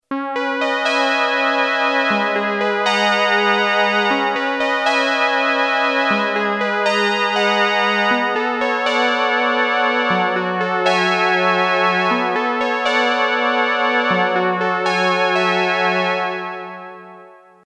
synth clean dual, yamaha dx21

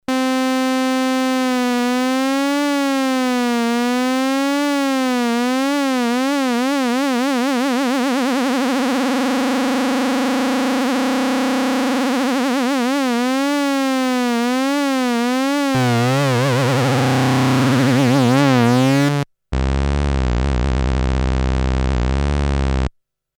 LFO pitch all frequencies, Korg DSS-1; main core based on 8 bits CPU Intel 8085 manages 8 voices polyphony

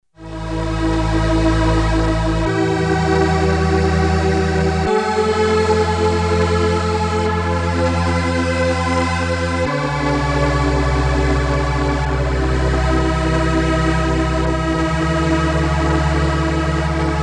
pad mystic; pad: mystic 2; Solton Project 100; Solton SM100

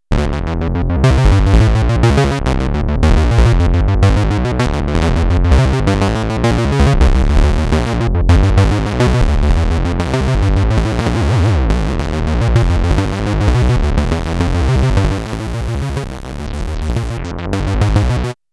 synth surprise, Moog Minitaur

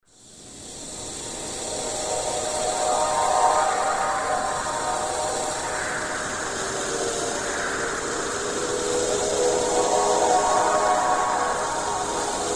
fx wind; digital Zilog wind; roland mvs1

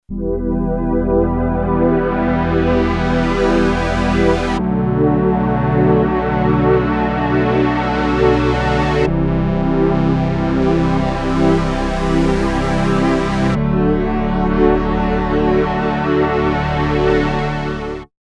pad major, Kawai sx240

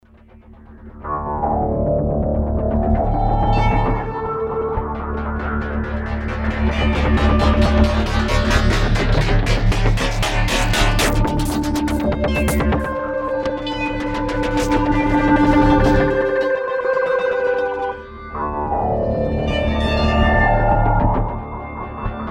synth fm, yamaha sy99

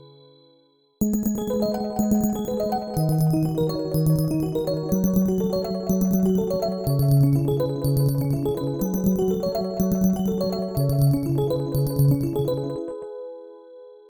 bell trance, Roland JV880

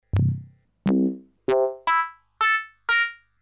funkys, yamaha vss 100; funk synth

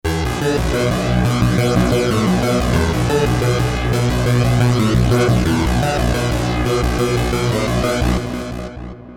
bass, Creamware minimax

